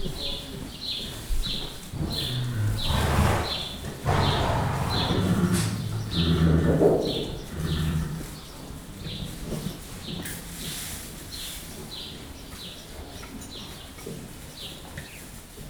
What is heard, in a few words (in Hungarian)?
nyihogások távolból, ló istálló; termeszetben